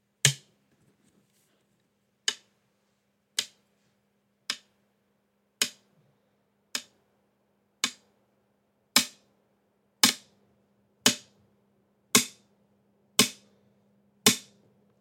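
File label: click between hard objects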